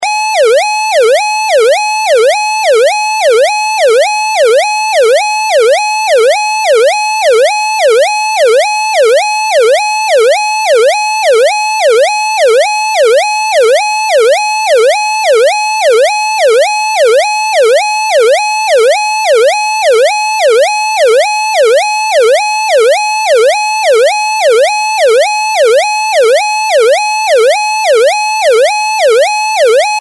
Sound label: Warble